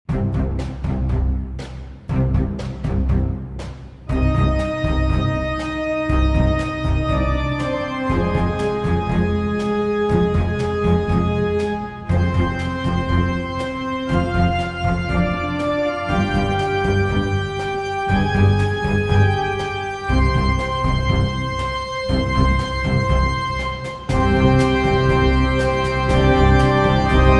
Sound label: Organ